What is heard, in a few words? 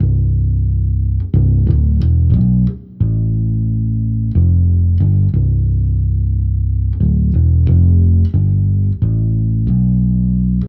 E Bass; Сэмпл Bass (Chillout); 90BPM; Loops; БАС